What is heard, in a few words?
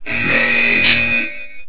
blare mage